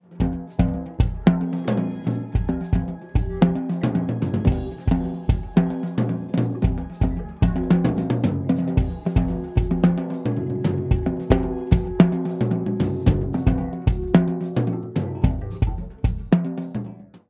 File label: clean loop; Portico II